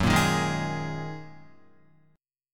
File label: Gb 6th Add 9th Gb down Guitar; strummed; Gb6add9; JGuitar